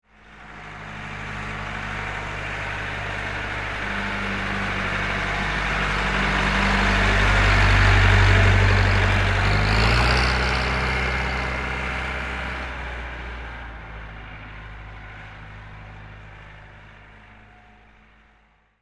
Tow Tractor Pass By, Industry, Tow, Tractor, Pass, By, Diesel, Engine; Pettibone